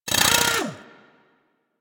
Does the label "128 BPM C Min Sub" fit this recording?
No